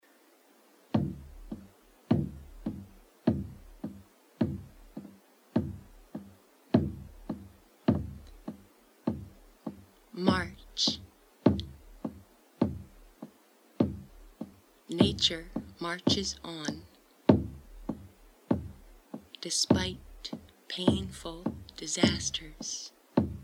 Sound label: flute haiku; march